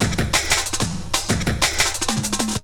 Essential beats; FM 57 - The Ultimate Sample Collection (1997)

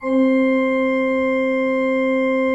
C3, Cathedral Organ; ILIO; Propeller Islands Cathedral Organ

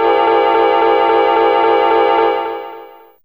Dawn A4, Fairlight CMI IIe Effects

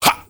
MALE RAV, MALE NOISE, Heart of Africa

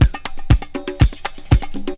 Percussion Loops, Brazilian; Houseworx

